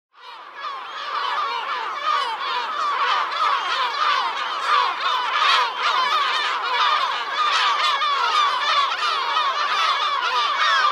Lesser Black-backed Gull

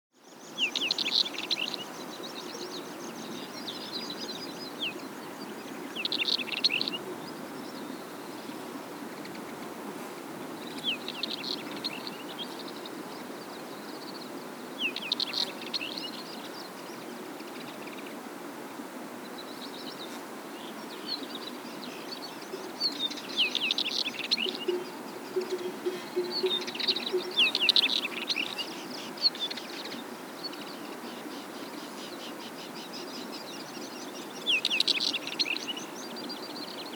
Wheatear

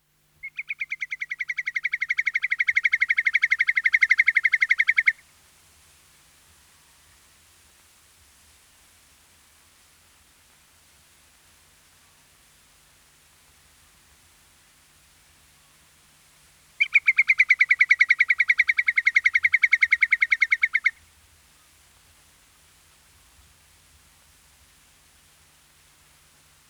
Whimbrel

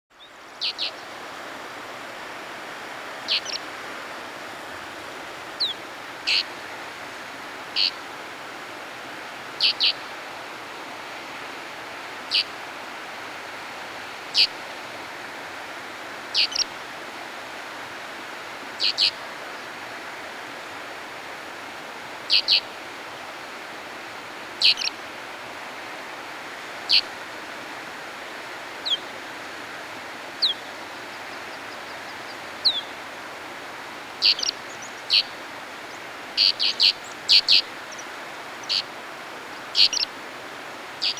Snow Bunting

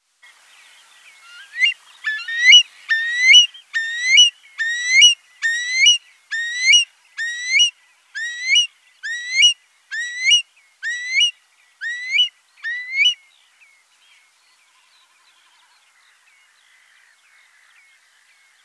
Curlew

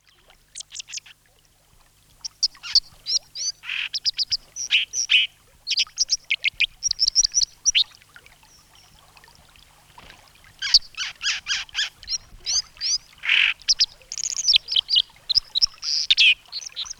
Dipper

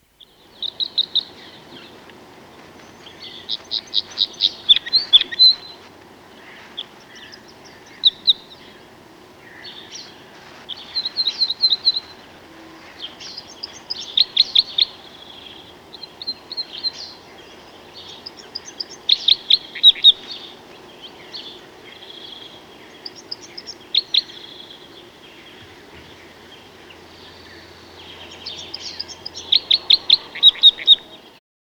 Scottish Crossbill, birdsong

